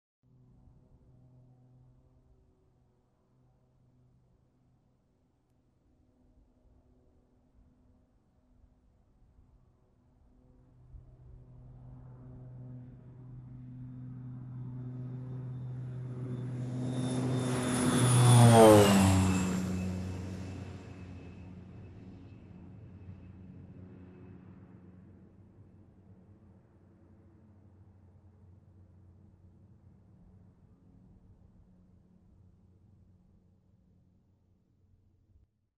Light Aircraft Flying by

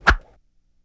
Whoosh snatching sound

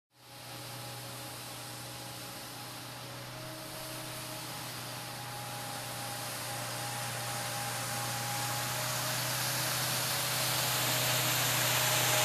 Street cleaning vehicle driving by

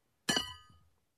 Horse hoof cleaning sound

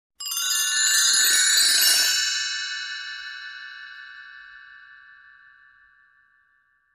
Granted wish sound effect long

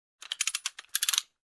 Tightening a Screw

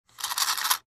Small Coin box shaking

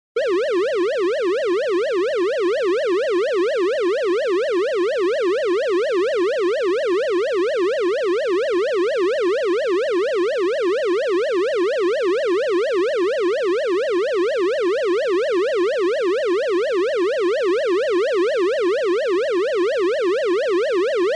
Electronic Alarm Sound

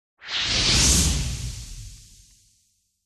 Big Light Up Sound

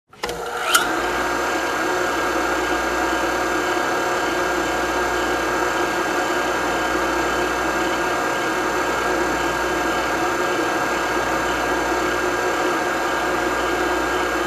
Industrial Machine Turned on up-close

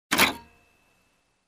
Loose Screw of a machine

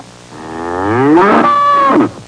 Cow mooing distressed

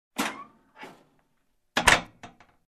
Open and Closing office door

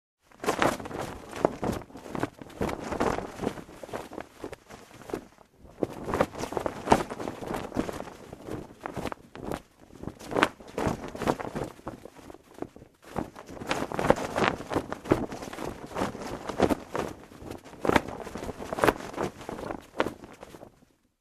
Shaking leather rag

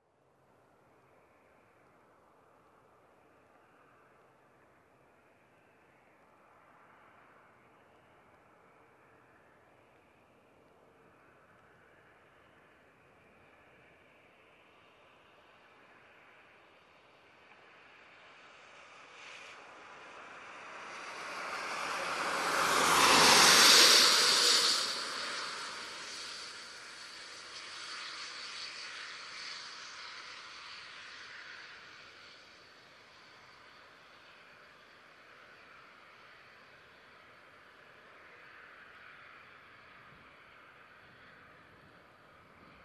Car driving by on wet pavement

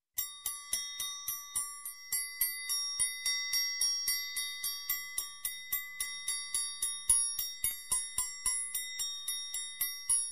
Rhythmic Bell Ring

